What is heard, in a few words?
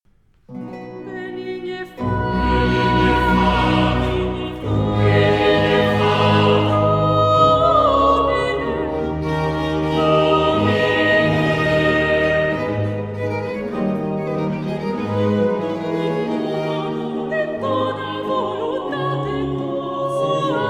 Gregorian Chant; Miserere; Psalm 50; Benigne fac Domine in bona